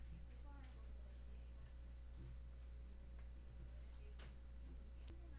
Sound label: crowd